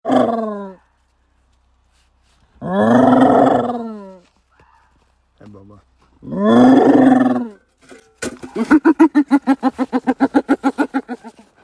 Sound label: Hyena Threat